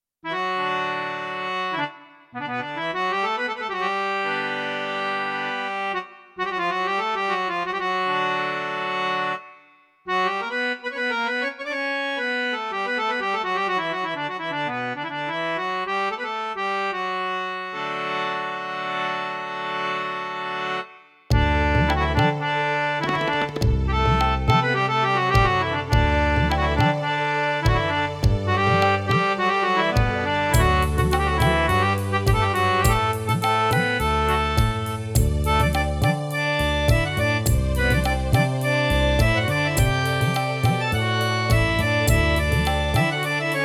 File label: Harmonium